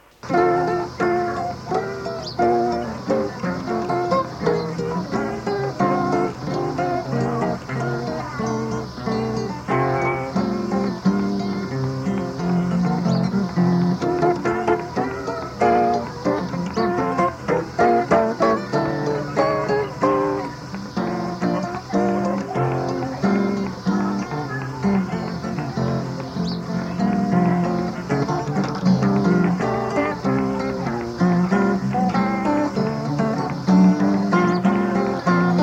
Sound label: EADgae maskandi